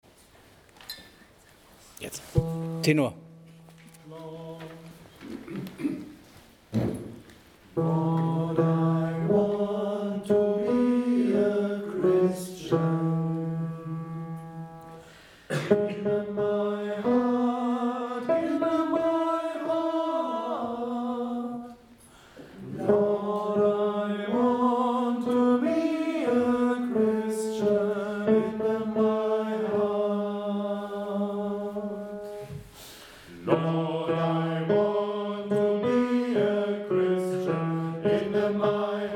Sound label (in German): Tenor; Lord I Want Kana Kameme